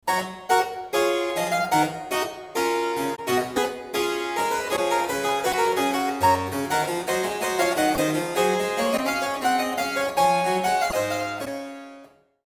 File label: Bach Gavotte